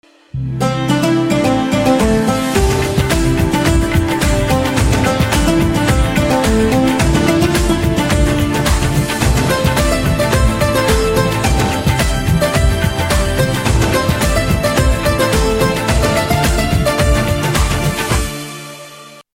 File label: Piano Instrumental Ringtone